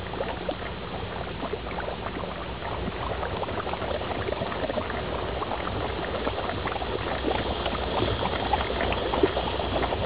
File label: swim